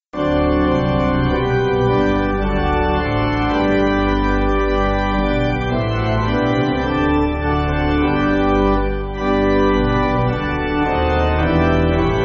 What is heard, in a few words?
Pipe, church music